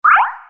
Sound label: Ding Dong; Samsung SGH-i300